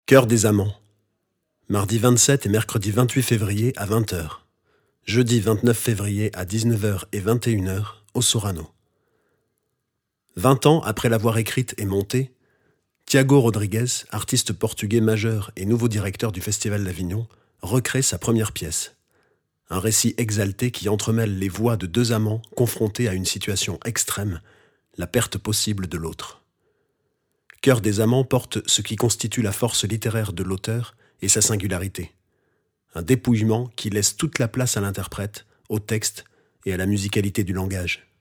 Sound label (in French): choeur des amants